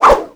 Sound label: kobold whip; weapons; dota; creep; neutral